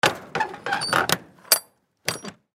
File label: Semi Truck Trailer, Semi Truck Trailer Rear Door Latch; Semi Truck Trailer Rear Door Close Latch; Industry; Trucks; Blastwave